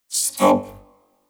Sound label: stop; Cyberpunk BreachHaxx0r; warframe; scanner